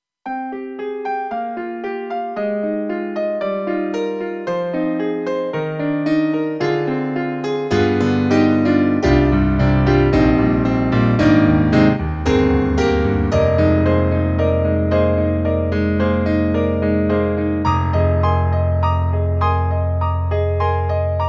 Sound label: Grand Piano; KORG; EXB-PCM08; TRITON-Rack